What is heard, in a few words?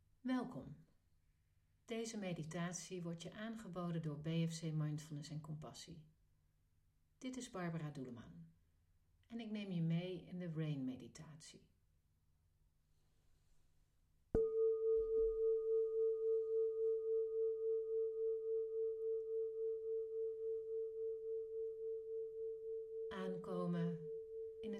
RAIN; meditatie